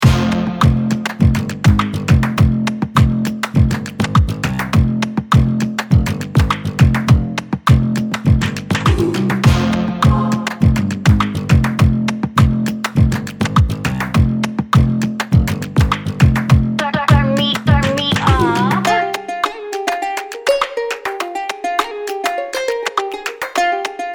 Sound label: 102 bpm, beats